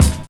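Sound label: bass drum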